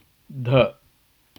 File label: dha